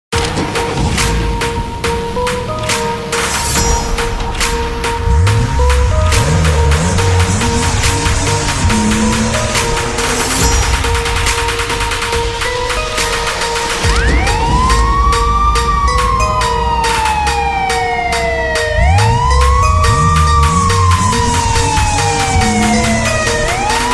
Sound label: Trap Bass